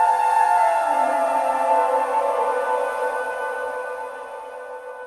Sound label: Spatial Reverb, Flute, Wet; Virtual Room Reveb; Syntheway